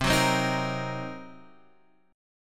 C Minor 7th Flat 5th Guitar Half Step Down, chord; Cm7b5

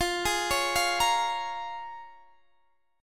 Bb Minor 7th, guitar

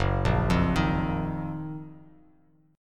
F sharp Major 13th Bass Standard; arpeggiated; JGuitar

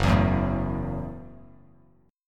F sharp Minor 7th F sharp, Bass Standard; strummed; JGuitar

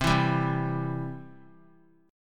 C Major; strummed; JGuitar

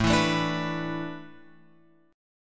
A Suspended 2nd Suspended 4th; Asus2sus4 Chord {5 7 7 7 X 7} strummed

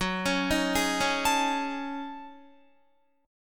Gb Minor 6th Add 9th Gb down Guitar; Gbm6add9; arpeggiated

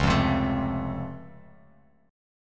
Db Augmented Major 7th, down, Bass Standard; strummed; JGuitar

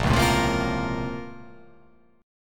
A sharp Minor Major 9th

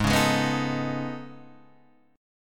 A Major Sharp 11th, G sharp

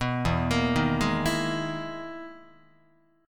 E-Major Sharp 11th E, Guitar; EM#11 arpeggiated; JGuitar

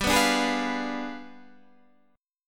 G 7th Flat 9th, Guitar, Standard; strummed; JGuitar

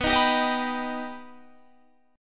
B Augmented B Guitar, chord; strummed; 18,18,19,20,19,19

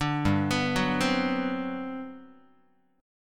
G Major 11th, Guitar; GM11 Chord

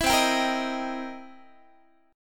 C 7th Sharp 9th, C, down, Guitar; C7#9; strummed; 19,20,22,20,19,x; 4A,4E,5A,5D,5G#,6C#

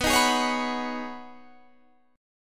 B 6th Add 9th B down Guitar Half Step Down

